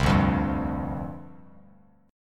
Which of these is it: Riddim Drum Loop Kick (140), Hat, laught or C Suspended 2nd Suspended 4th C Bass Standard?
C Suspended 2nd Suspended 4th C Bass Standard